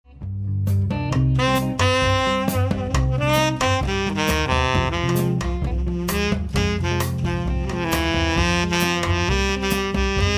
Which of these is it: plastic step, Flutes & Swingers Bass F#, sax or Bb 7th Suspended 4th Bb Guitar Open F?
sax